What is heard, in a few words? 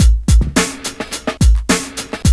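clnkr brk, clunker break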